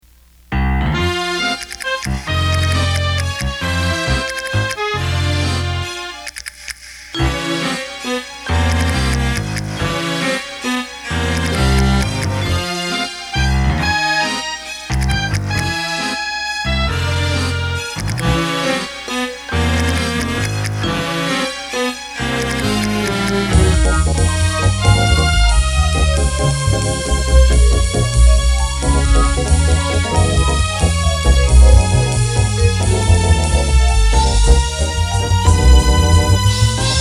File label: spanish waltz; sally zito